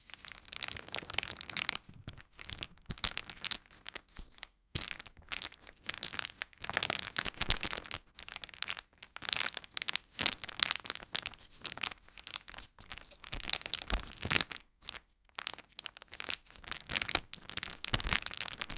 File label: plastic entanglement